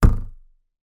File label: cartoon balloon hit twang; zapsplat